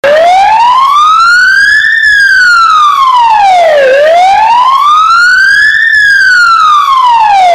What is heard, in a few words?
WAIL, sirene; sirene orbe